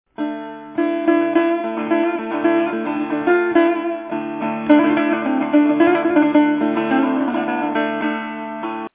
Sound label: setar